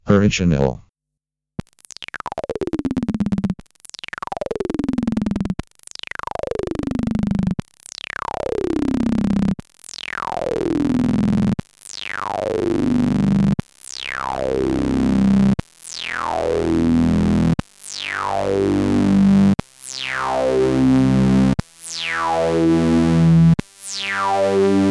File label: MS20 Original Mini Legacy HPF Mid Resonance